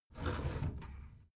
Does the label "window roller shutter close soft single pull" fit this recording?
Yes